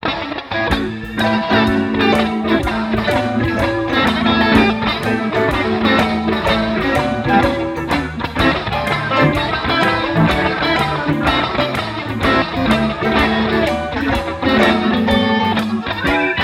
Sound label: backing